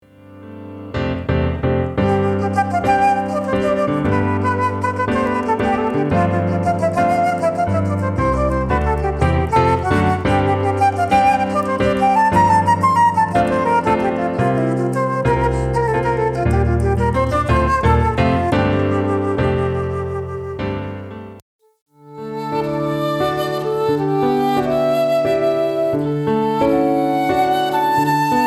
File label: Flute; Scottish Suite; flute and piano